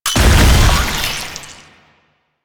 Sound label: Proto Infested Maggot Explosion